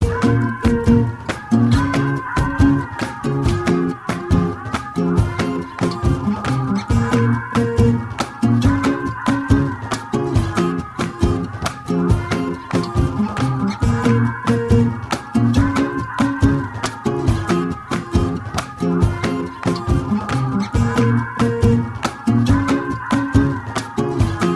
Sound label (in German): Gitarre Und Lala